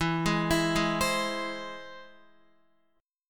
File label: E Augmented, Guitar; E+ arpeggiated; JGuitar